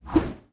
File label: ghastly glaive pierce